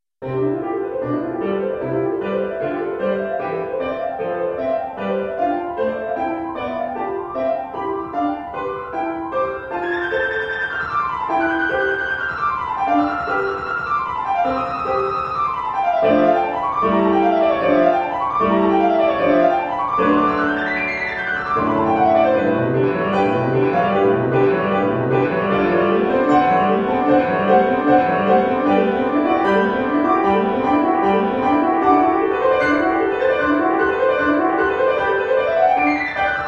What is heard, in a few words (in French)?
etudes velocite piano